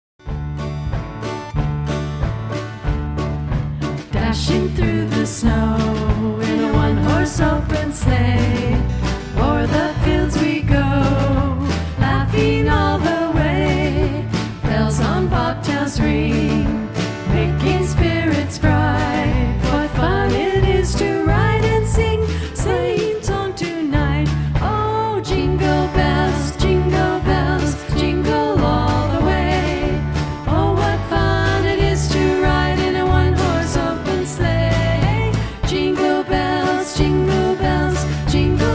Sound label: Jingle Bells; Christmas Practice